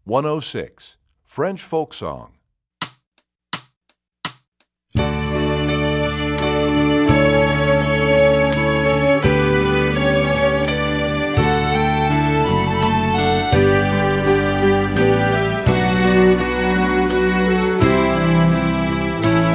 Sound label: French Folk Song, Violin Instrumental Accompaniments